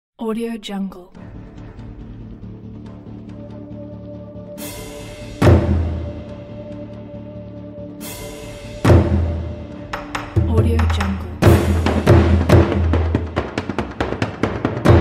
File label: exotic war drum instrumental background music